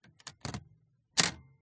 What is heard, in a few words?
typewriter carriage return lever; mkh8040; PMSFX